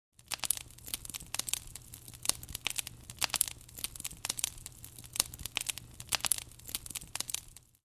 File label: Medieval burning torch fire crackling